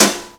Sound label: drum hit clap; osu